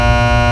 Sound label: trafo; Fx; willofsteel